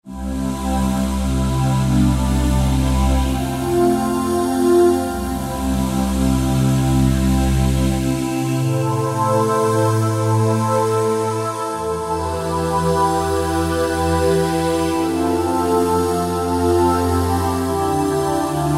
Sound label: Celtic Spirit, Roland SR-JV80-16 Orchestral II